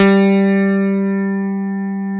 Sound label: CP70